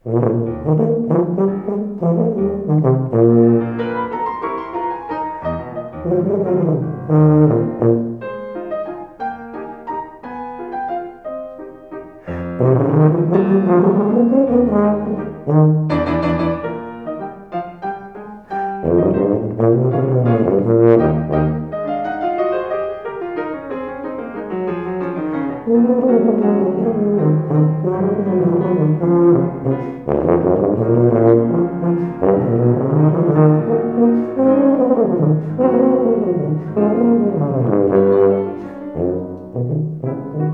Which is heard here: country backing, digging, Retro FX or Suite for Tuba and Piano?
Suite for Tuba and Piano